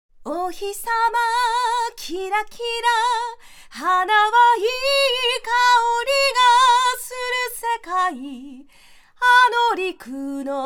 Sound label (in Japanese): 歌女性